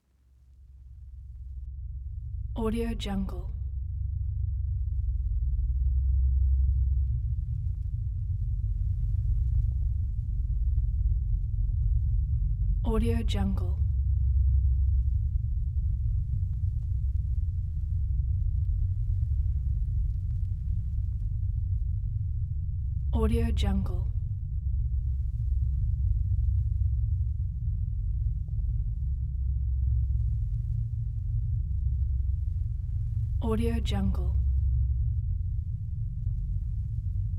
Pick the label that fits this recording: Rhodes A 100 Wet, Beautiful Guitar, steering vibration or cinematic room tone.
cinematic room tone